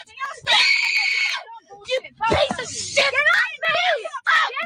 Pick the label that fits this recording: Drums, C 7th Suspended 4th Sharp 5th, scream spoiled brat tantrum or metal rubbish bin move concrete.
scream spoiled brat tantrum